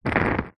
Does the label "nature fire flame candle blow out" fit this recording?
Yes